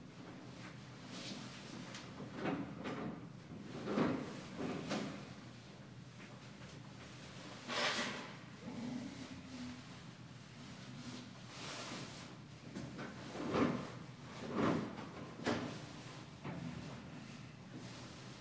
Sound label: quietly groaning